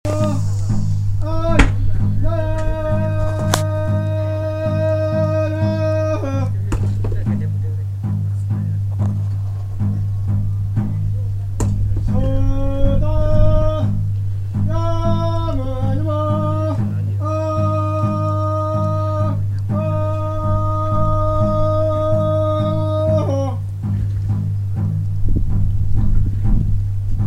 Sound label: chanting from rooftop in Kagbeni; Annapurna Trek Day 11